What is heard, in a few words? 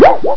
WOUOPUP; psion cd vol1